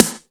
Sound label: HOUSE DRUM SD